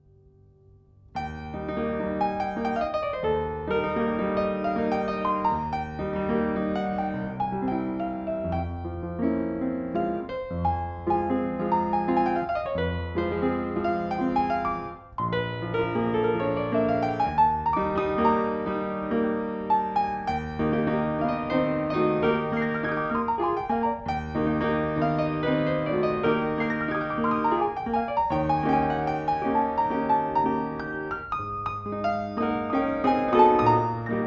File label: welte-mignon andante spianato; Suzanne Godenne; Frederic Chopin; und grande polonaise